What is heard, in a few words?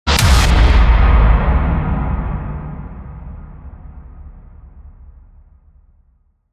Tension Builder Impact